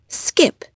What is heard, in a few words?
skip